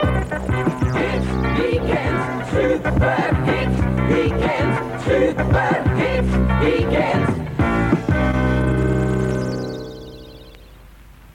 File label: Jingle Super Hits Weekend